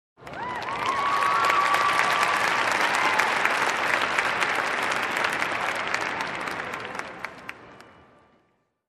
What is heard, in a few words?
Fans in a sports game cheers and applause